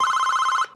INTERCOM; Yesterday Origins

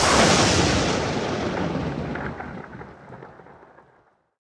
Lightning; boomerang fu

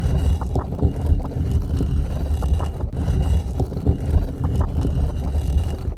sound Moving Blades

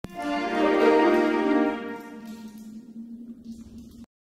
Push Jingle; Asterix at the Olympic Games

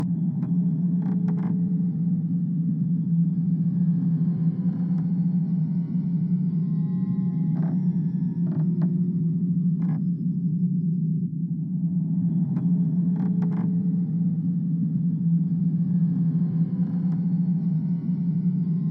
Hallway Ambiance

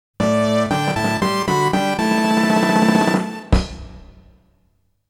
Victory in Combat; Koei Original BGM Collection Vol.8; Super Nobunaga no Yabou Zenkokuban; Taikou Risshiden

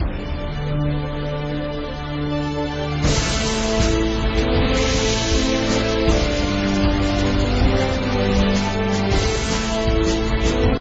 Music Opening; Brave Fighter 2 Monster Legion; Android

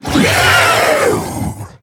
Zombie Monster Die; Undead

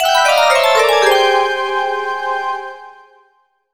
Mission Fail; 1500 DS Spirits Vol. 10 Igo; NDS

